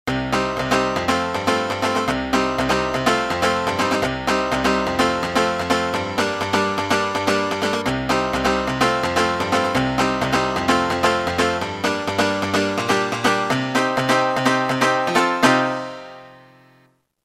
House Piano; Roland M-DC1

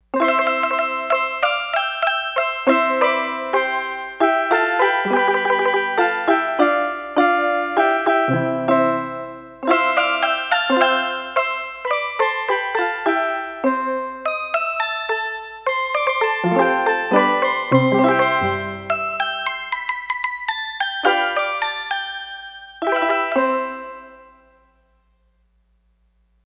Shiny Steel Drum, Roland D-10; Factory Patches

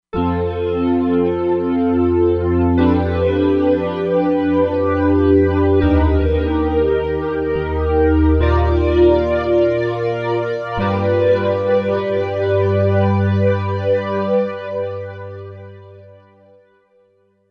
Pad Smoke Pad

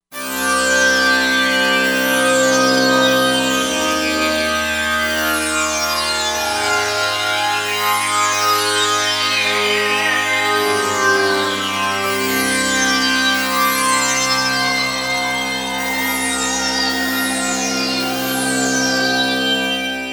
Pad Sync Pad

